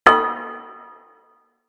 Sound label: Kid Glass Tap; Ambience; Props; Void Kid; Warframe; The New War; Zariman; Quests